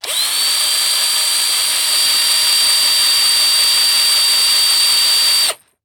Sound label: Industry Tool Power Drill High